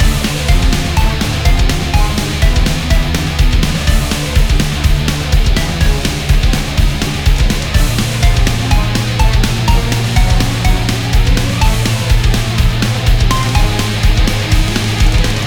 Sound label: chase loop; slender; snatcher